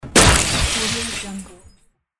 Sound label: smash; volkovsound